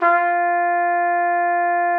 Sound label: TRUMPET F4; Xsample VOL-3